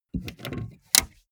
car bmw e46 exterior gas cap open short

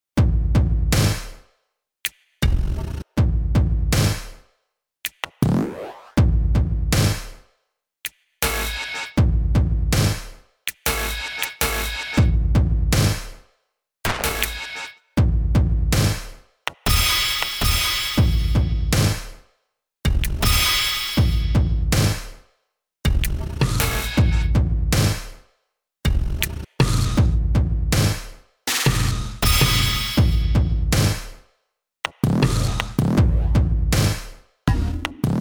industrial groove; music elements; grooves

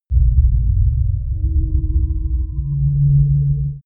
digital idle smooth, atmosphaeren

